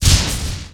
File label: general attack; zuus